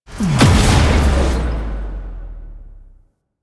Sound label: Exalted Sniper Cast Impact; Warframe; Warframes; Frumentarius